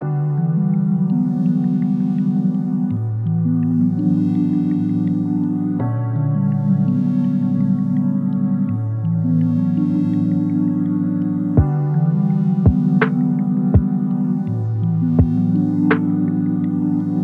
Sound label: Mystical Plant Melodies